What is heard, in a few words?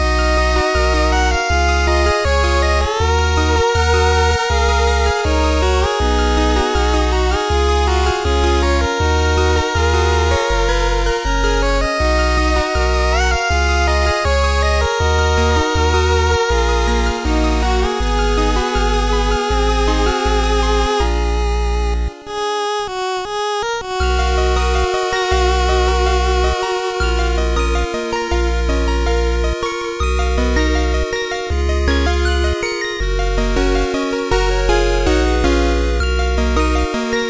8Bit; PMD2